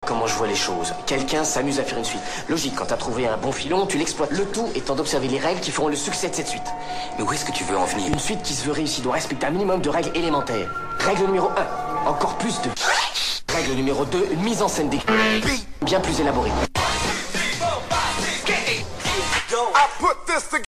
Scratch tools

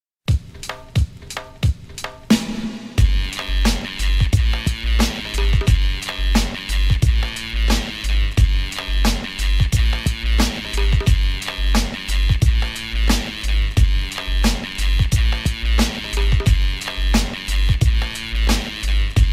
Break

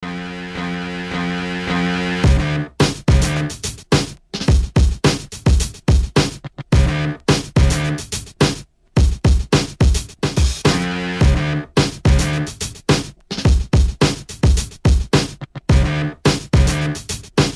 Break

